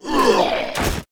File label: Warrior death; AquilasTemple